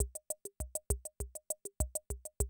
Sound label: CR78, DrumLoops